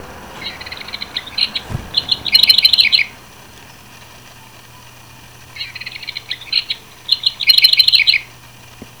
House Wren

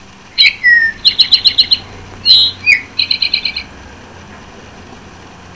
Eastern Towhee

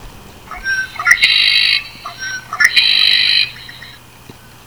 Red-Winged Blackbird

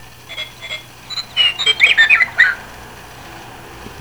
Meadowlark

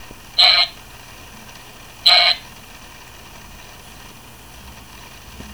American Woodcock

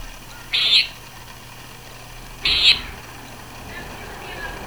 Common Nighthawk

